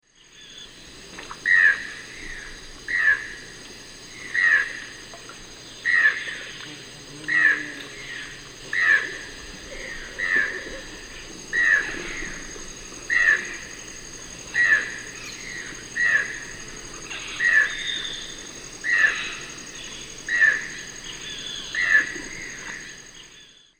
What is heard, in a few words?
Ramphastos vitellinus, song; S. America; Eastern Lowlands